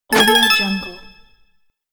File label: whoosh pickup bonus